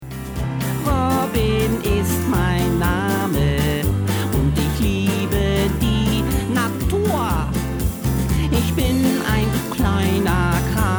Gesang Deutsch Kinder; LOFT VOICES

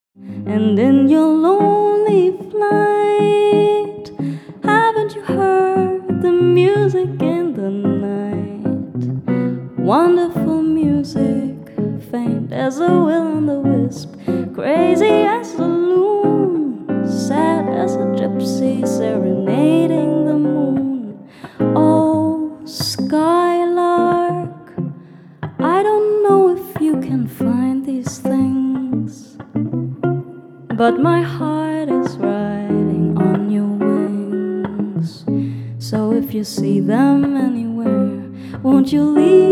Jazz Gesang

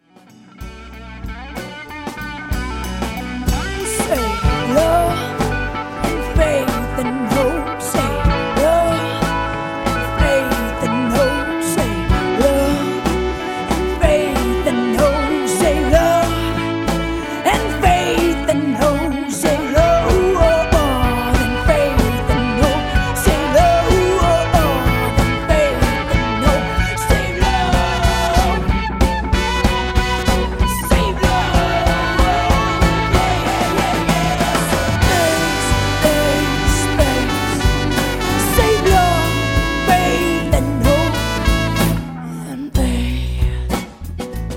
Gesang Band; LOFT VOICES

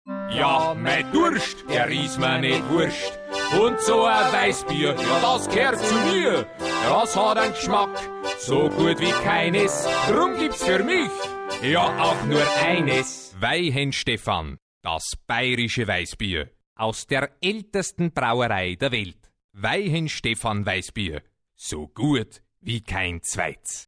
Gesang und Off

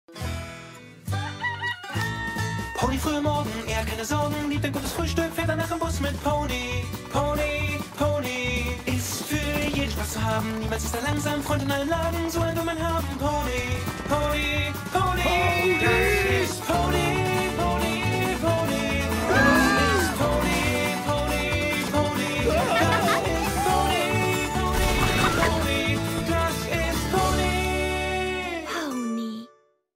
Gesang Titelsong

